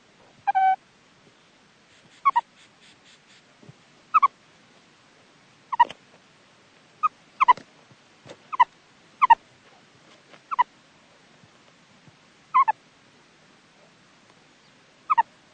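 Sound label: surikat malysh, meerkat baby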